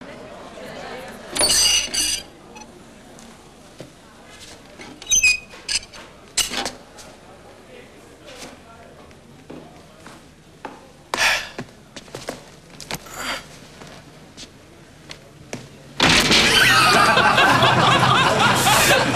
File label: doorhandle